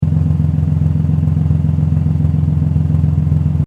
Power Car Idle Loop Short, Engine loop; Power Car sounds; Car Sound Effects

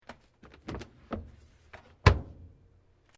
Washing Machine Folding Lid Close; Toshiba; Household Appliances; Laundry

